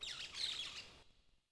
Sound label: Forest Bird; Civ4 warlords